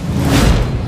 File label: impale